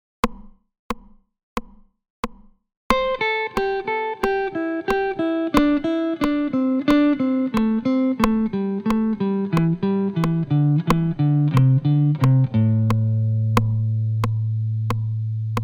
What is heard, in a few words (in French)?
Vers le grave; objectif solo 15 jours pour apprendre a improviser